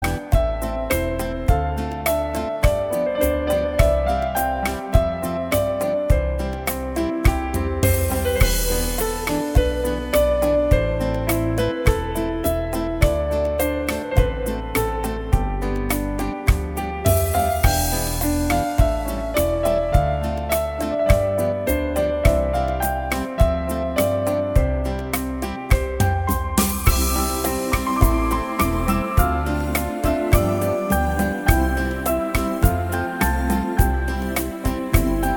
it came upon a midnight clear rumba

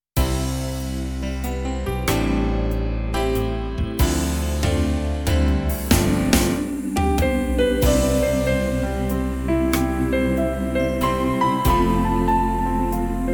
waltz

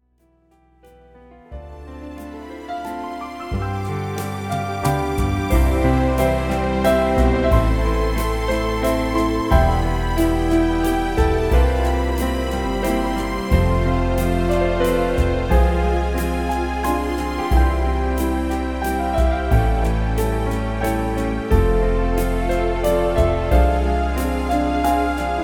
WALTZ

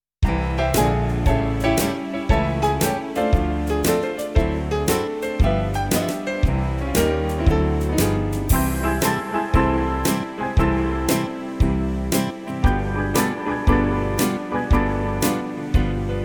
blues

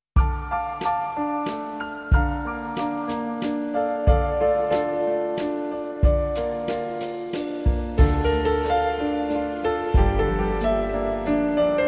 serenade waltz

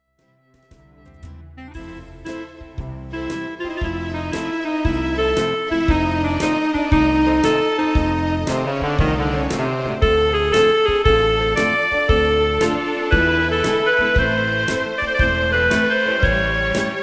BLUES

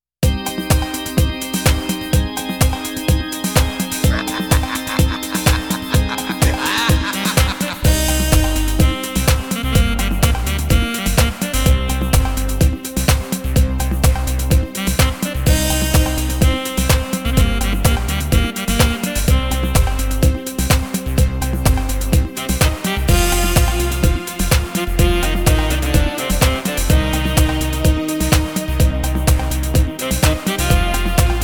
cha cha

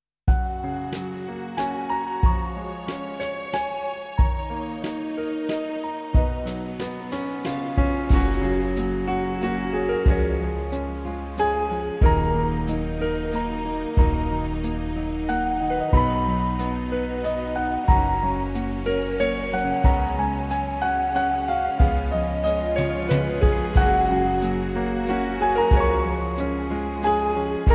waltz

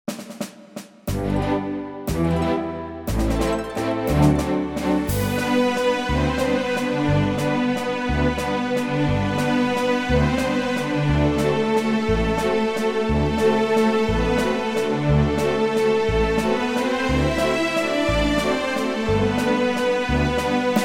Swing medley

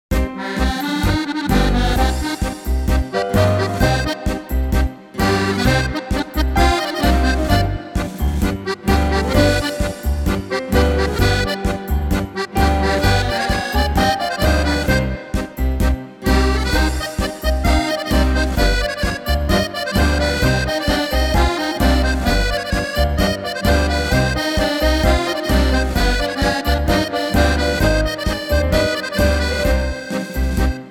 TANGO

